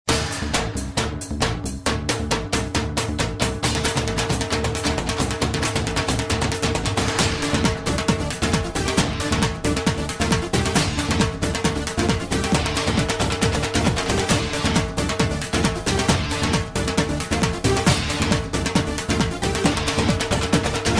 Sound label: Bhangra Instrumental